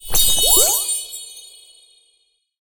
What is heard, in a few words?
greater heal; battle